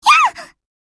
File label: Scream; Shimakaze